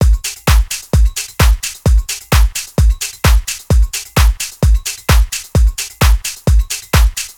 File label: Drum Loop 130, Downtown House; Drum Loops 130bpm